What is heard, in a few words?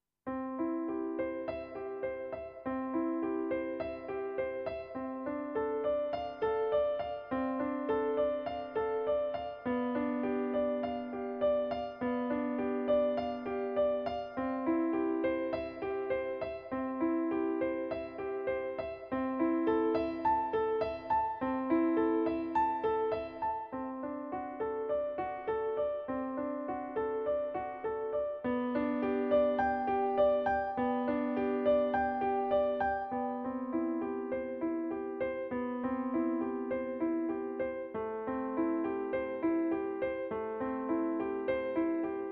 Grand Piano